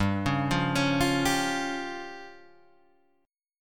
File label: F Suspended 2nd Sharp 5th G